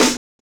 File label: Snare, Drum and Bass